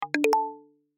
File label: success